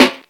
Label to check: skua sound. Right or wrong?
Wrong